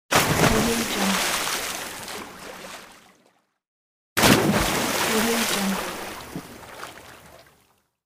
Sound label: water splash